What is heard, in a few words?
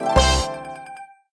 small Win; flowers